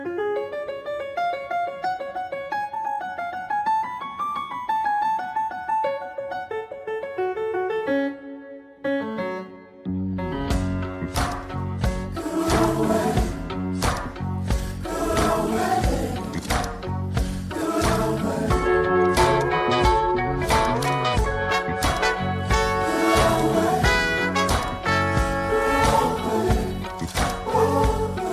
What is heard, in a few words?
Instrumental